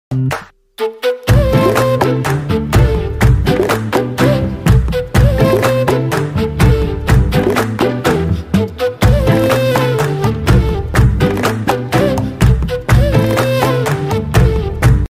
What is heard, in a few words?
bgm